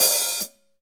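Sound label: HH, hi-hat; Sound & Vision - Gigapack I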